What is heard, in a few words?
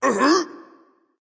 surprised; smite; voicepack; ravana